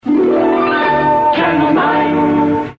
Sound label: Jingle